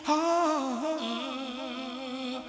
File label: VOC